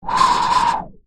Wind

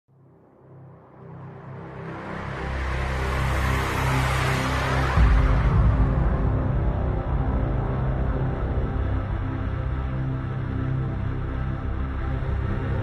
Login Menu Theme Song; Season 01 New Order

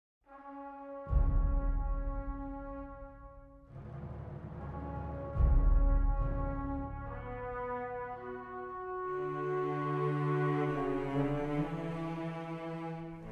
World Music

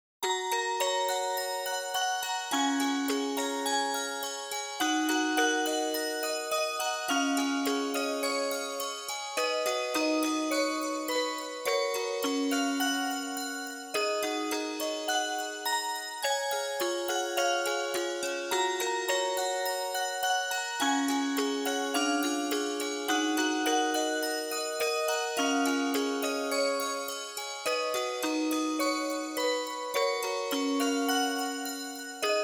Music Box Ver.; Ai Cute Kimi ni Koishiteru original soundtrack

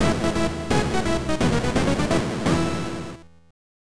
Team Switch BGM; The Pro Baseball Clash Pennant Race; MSX2; SCC

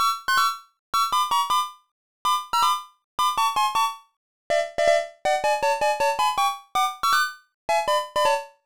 Jingle, CLASSIC FROG DOCK; Frogger Helmet Chaos; nintendo ds

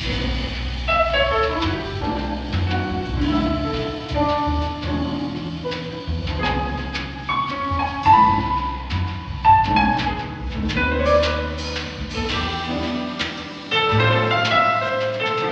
Music Ballroom; Hitman Blood Money

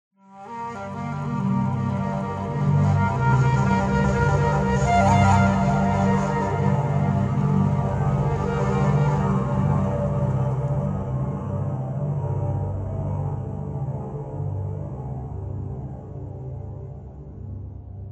Atmospheric Mystery Flute

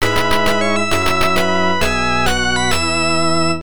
Unused Jingle

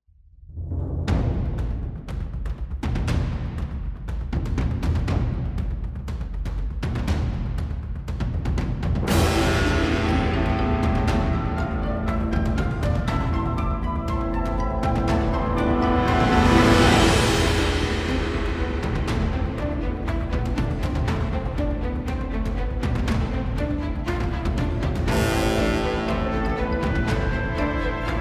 Event BGM; Xenoblade Chronicles 3

